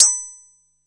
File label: SHARP PING; Zero-G Ambient 1; ANABELL PERC